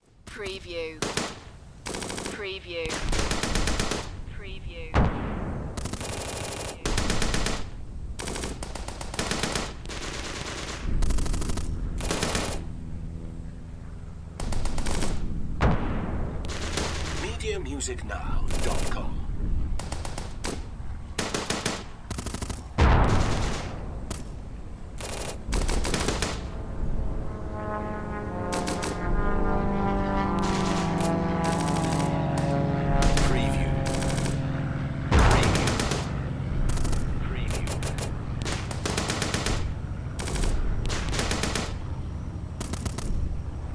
Warfare WWII World War Two Battlefield Forest Planes Distant Explosions